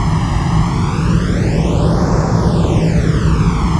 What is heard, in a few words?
strange air sound